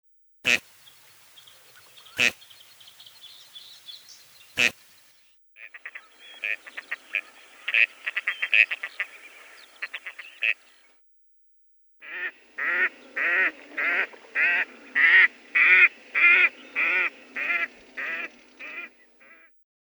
Anas strepera

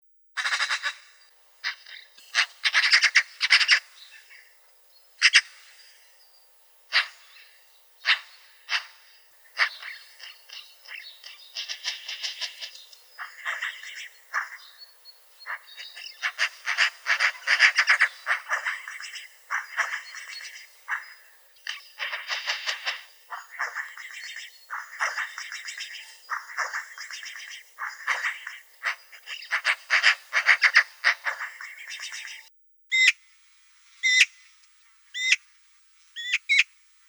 Pica pica

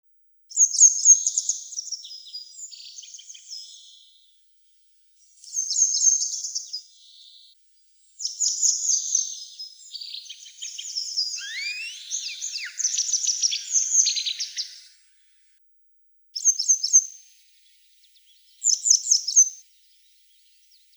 Motacilla cinerea

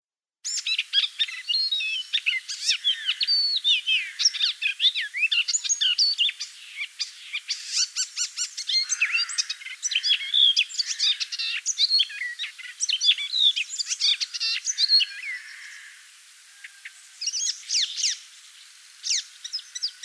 Hippolais icterina

